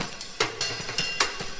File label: frenzy funk, drum loops